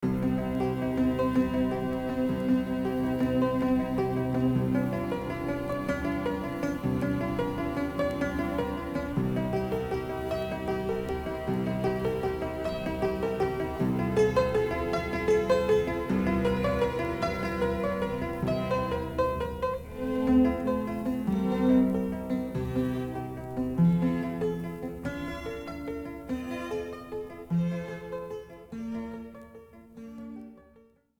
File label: pianoforte